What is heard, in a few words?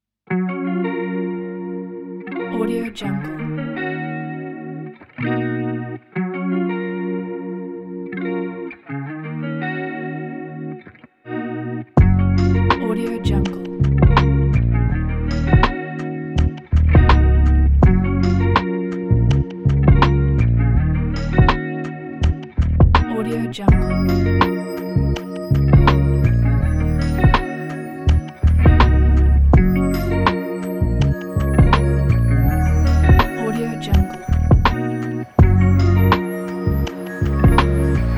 chill for relax